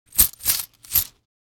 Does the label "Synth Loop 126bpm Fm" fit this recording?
No